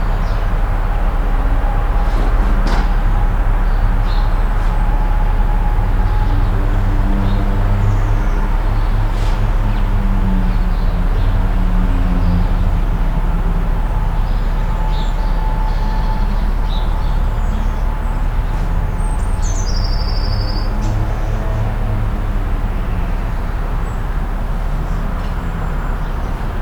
Atmo Sony PCM D100

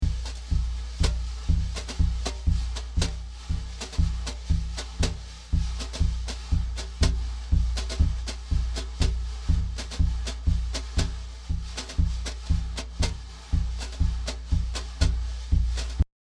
Duet Besen Bass Drum

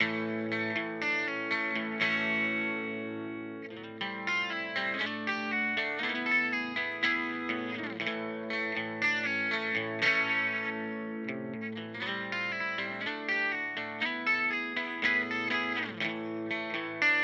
Boss GT-100 Core Deluxe Reverb Kompressor

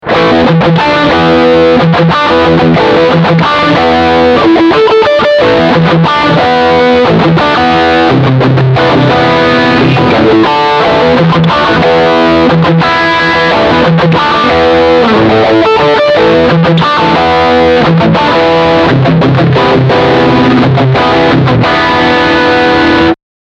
Steg Humbucker Riff Distortion

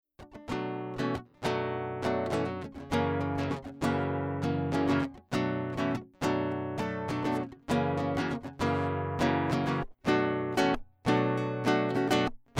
Ak Guit Nylon DI Signal EQ All Bypass Mit Headroom

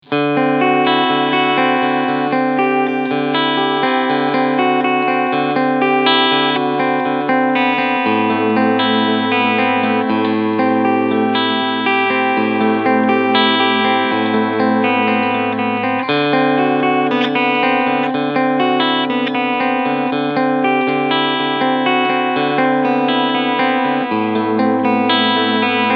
Cleansound Steg PU

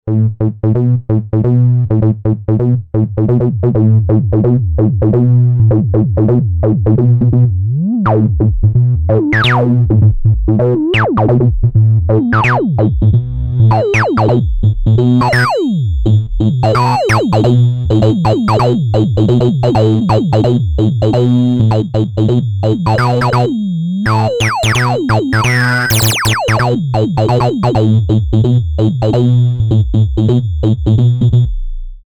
6dB Resonanz Modulation; Io Instruments Euporie

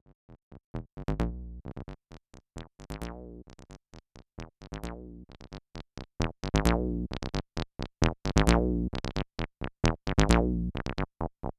Leise Lauter Verzerrt

